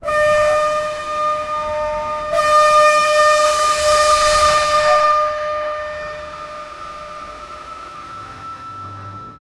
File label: industrial sirene steam, steampunk